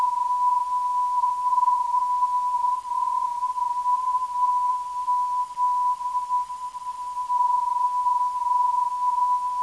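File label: VZ 403kHz, non-directional beacon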